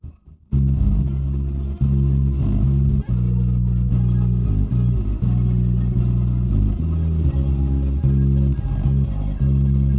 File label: no drums inst src; SiSEC08; SiSEC underdetermined